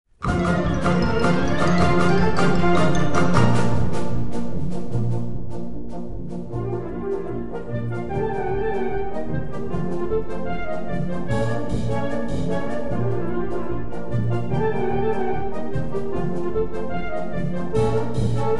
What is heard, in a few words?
Busy bee